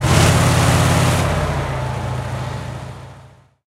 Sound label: t90a engine load; War Thunder